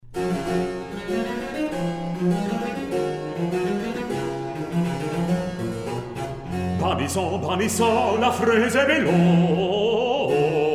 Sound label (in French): Air; Bacchus